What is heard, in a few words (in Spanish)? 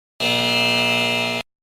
RESPUESTA INCORRECTA